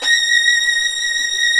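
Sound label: strings, Vlns 8 fff Agitato; Roland L-CD702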